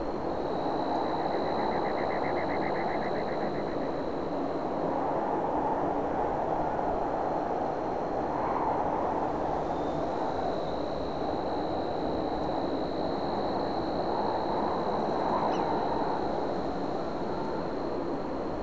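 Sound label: ambience windy desert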